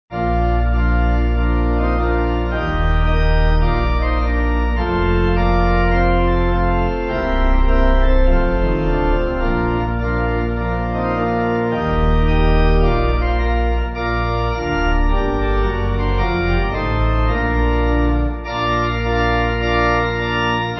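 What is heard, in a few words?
Pipe Organ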